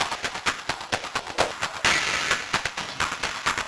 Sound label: 8 Bit Beat, Modular Electronica; Beats; Sonic Foundry (Sony Creative Software)